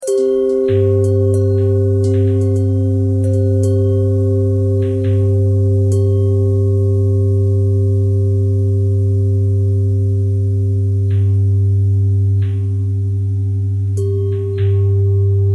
bell thread simple

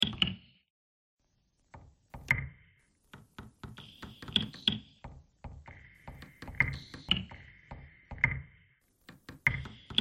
bagpipe thread noise

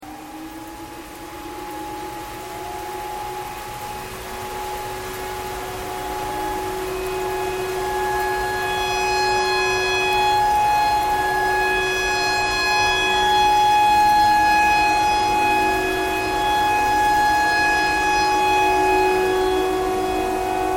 tornado siren tornado siren thread pentatonic

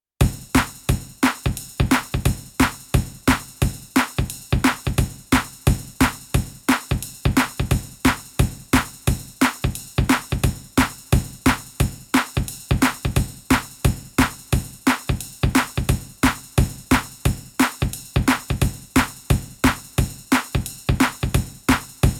hello beat only

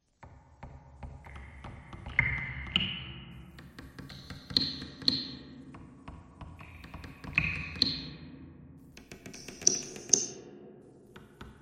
bagpipe thread noise; reverb

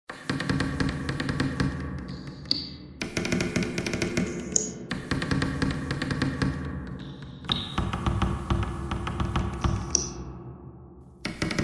bagpipe thread noise; reverb

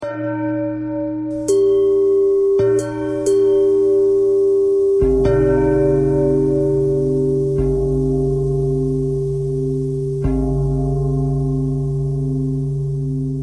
bell thread pentatonic

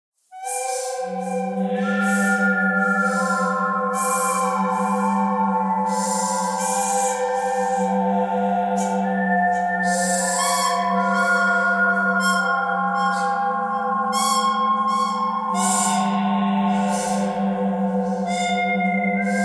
bird theme bird canyon thread pentatonic

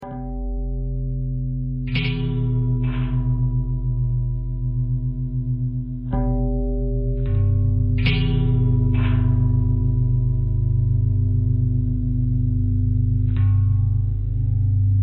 long bell bell threads, bells

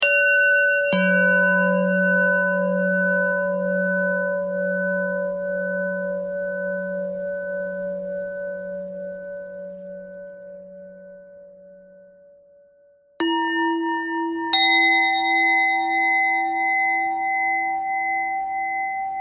bell bell thread noise

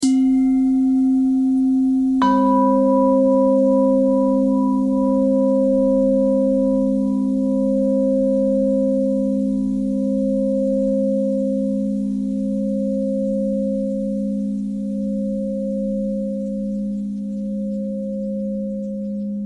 bell thread pentatonic

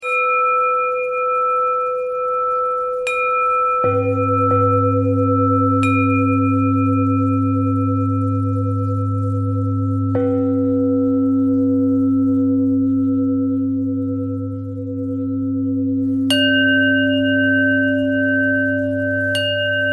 bell thread bend thread

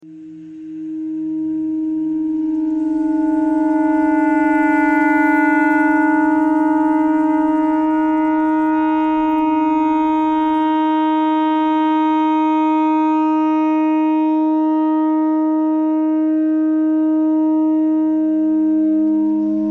clarinet notes e thread noise

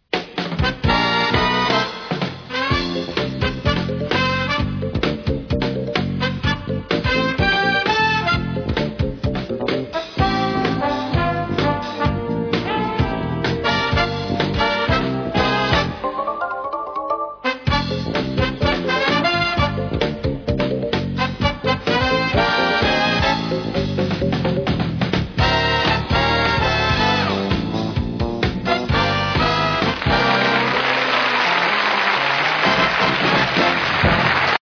tv theme full

